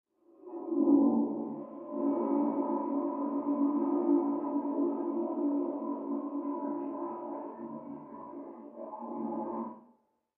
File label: Toilet Flush Water, pipes